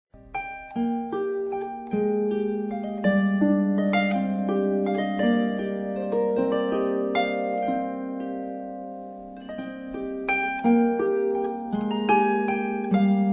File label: Love Waltz